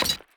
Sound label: weapon down; skyrim